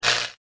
electric shock

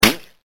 water balloon

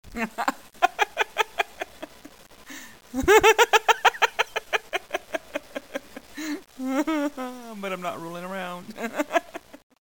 laugh

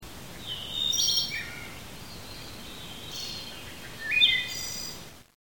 Songbird

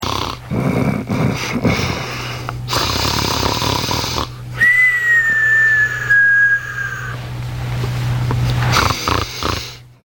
snoring

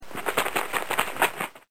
flapping

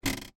Car Parking Brake

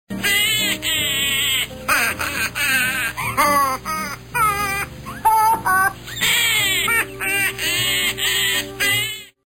laugh; snoopy

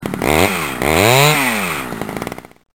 CHAINSAW

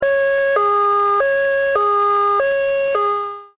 siren hi lo

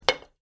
Can Bang

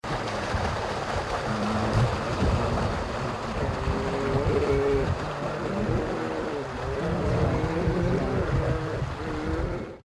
bear hunt and growl

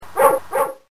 dog bark

